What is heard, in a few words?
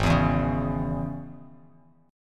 Ab Suspended 4th Sharp 5th chord, Bass Standard; 4,7,2,6; strummed; JGuitar